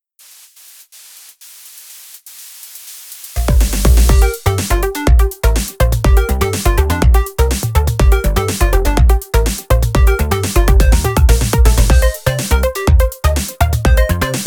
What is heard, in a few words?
Party Jingle short